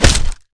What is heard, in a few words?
beat; gongjian